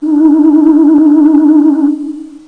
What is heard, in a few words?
lo whistle; meetmediaband; housejam